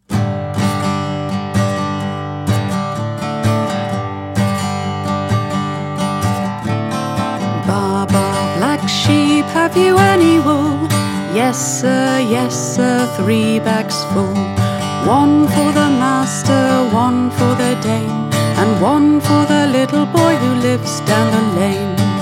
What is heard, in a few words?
Baa Baa Black Sheep